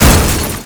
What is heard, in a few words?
Car Crash; Nicktoons Nitro; arcade